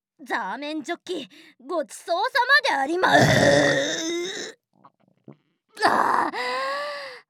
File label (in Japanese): ザーメンゲップ; 台本にないやつ; ザーメンゲップ集; ASMR